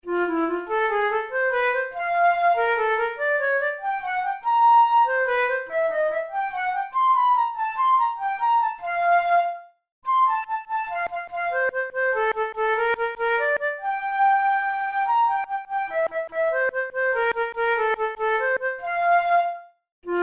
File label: studies for the boehm flute; drouet 72